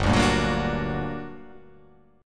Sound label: G Minor Major 7th G